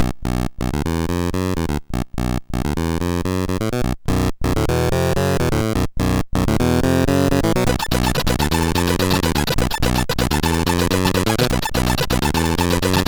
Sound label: Amiga remix, sid CSG8580R5; Sly Spy Tune 2